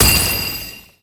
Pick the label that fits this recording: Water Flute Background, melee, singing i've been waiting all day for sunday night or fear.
melee